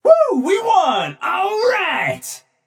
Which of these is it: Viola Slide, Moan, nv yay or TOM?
nv yay